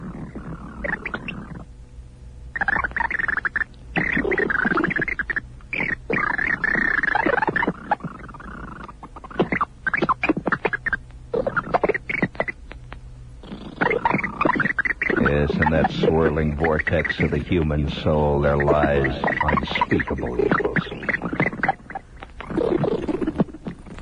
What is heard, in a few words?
Scary Music